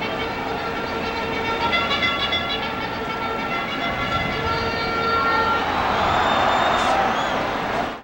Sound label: Baseball Fanfare; Crowds